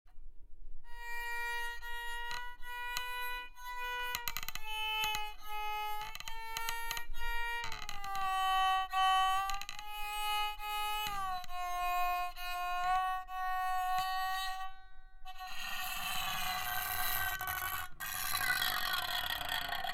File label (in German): Sait Geige